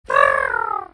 pigeon

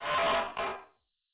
crate open

